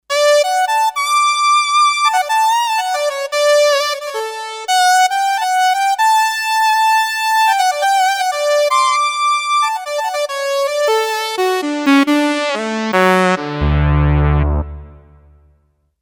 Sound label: Kawai K5000S factory presets